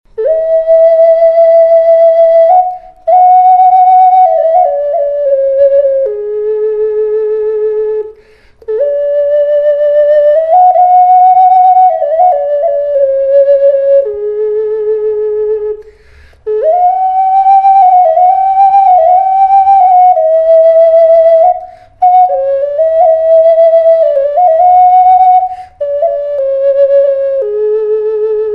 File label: chopo choor, kyrgyz